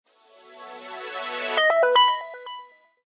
Power On, phonetones

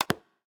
lock; LG; X power 3; newparticle

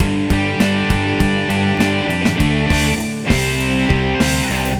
brit-pop, ringtones; Google; NewWave Labs

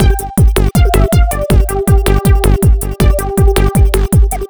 ringtone, electro; Google; NewWave Labs

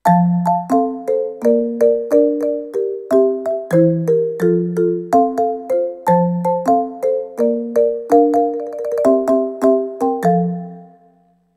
tropical customs; ZTE Nubia-Z17-Mini; alarms

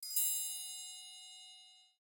Triangle Tap; Samsung; Galaxy A56; Calm

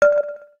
electric, keytone

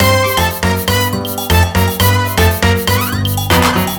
Latin, ringtones; Google; NewWave Labs

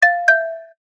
ding dong, notifications; ZTE nubia Z20

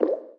chat msg in bg; Nokia; 770 Internet Tablet

